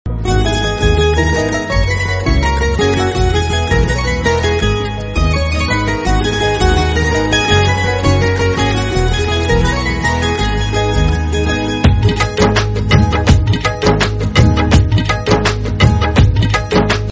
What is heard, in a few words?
instrumental